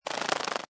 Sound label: Wings Flapping Large Insect Shake Wings; Sound Effects:Animals